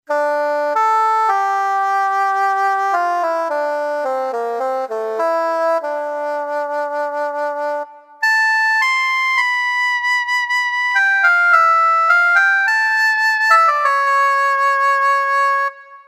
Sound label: oboe; moss